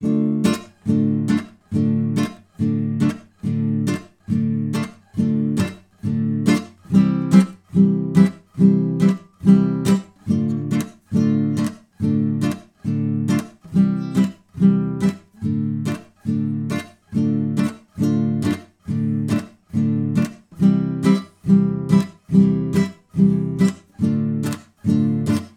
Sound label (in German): Gitarrenbegleitung; The Drunken Sailor